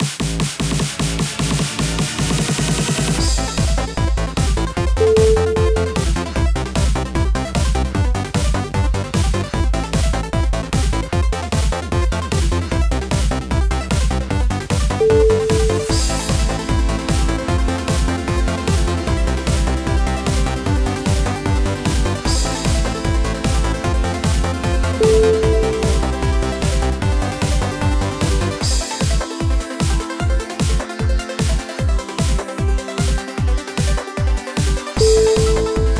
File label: 8 bit trance